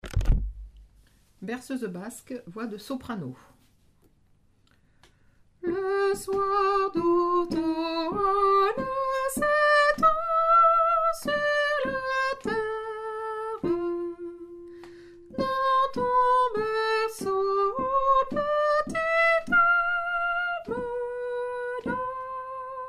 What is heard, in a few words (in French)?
berceuse basque soprano